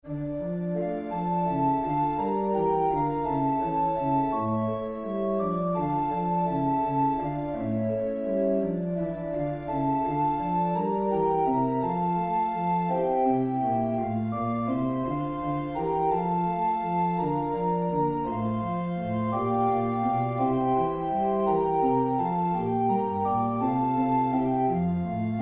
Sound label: Kyrie Quadragesimae Organ